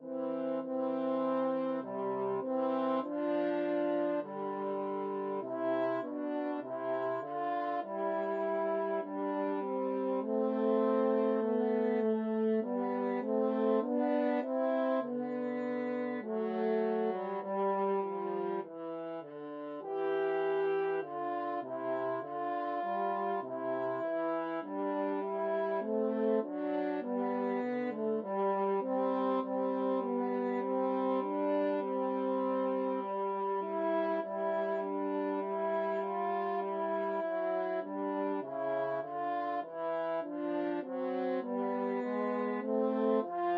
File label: french horn duet